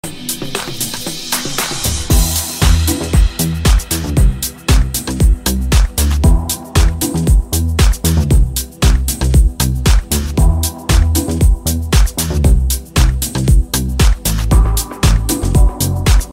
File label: new ringtone